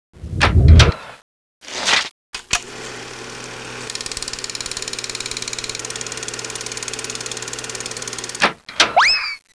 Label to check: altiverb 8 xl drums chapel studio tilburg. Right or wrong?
Wrong